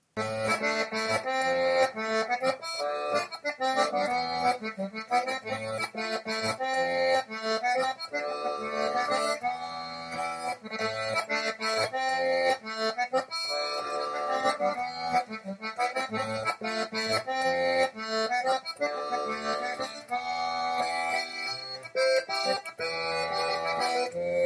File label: swing